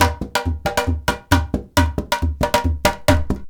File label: IJEILU GROOV; Best Service; World Colours